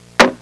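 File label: BOOK DROP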